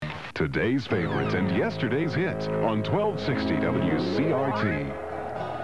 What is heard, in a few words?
jingle; wcrt